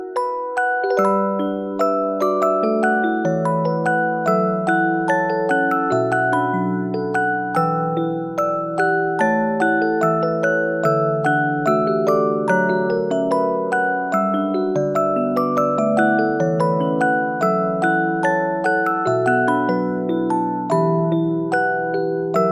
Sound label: Musicbox